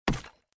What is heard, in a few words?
cut Tree; Big Paul